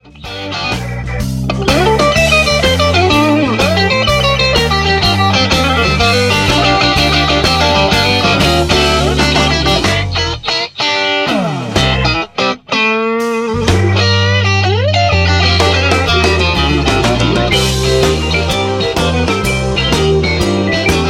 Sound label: BOX BLUES